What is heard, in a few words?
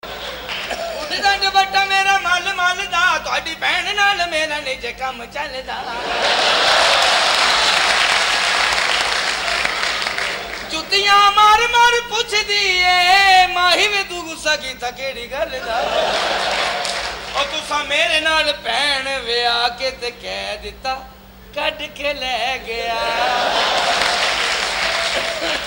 Punjabi